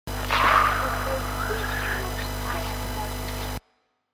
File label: animal